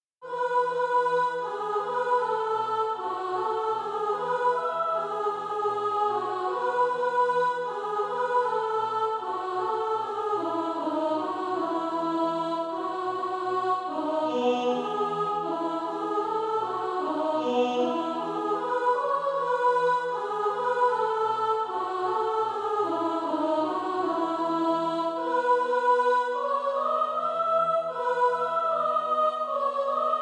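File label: soprano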